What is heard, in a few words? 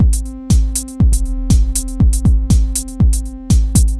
Basic House 120